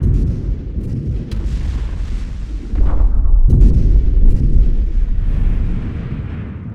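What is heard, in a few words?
sea ship battle ambience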